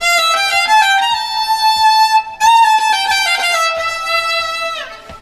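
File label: Balkanish Traditions strings; USB Soundscan vol.63; AKAI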